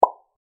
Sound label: pop